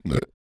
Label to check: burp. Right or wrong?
Right